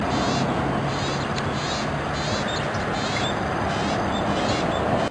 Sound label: Common Iora juvenile calls; Amar